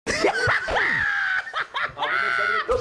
risada; Oscar Filho

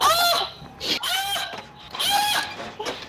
gemidao; discord

